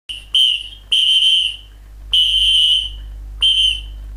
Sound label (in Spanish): tornado